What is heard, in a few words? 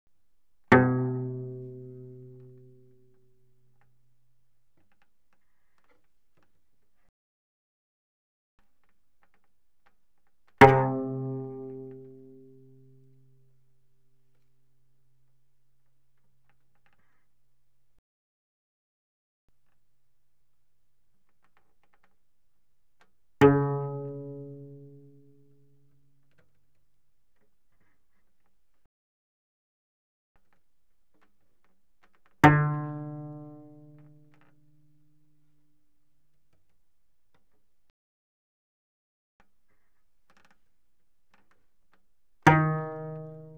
Cello pizz ff sul G B2 Ab3; Strings